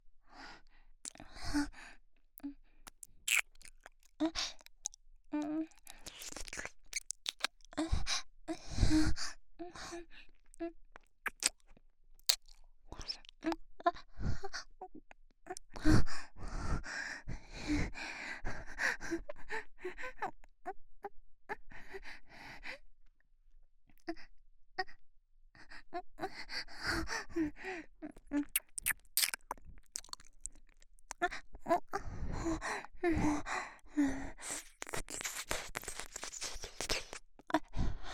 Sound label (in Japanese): 耳舐め(右)＋オナニー(絶頂まで)